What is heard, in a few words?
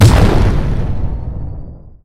clap, battle